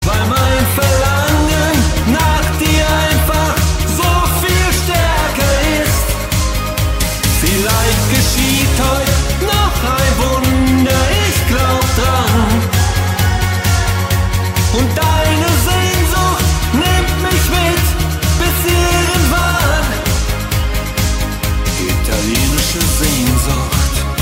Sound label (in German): italienische ringtone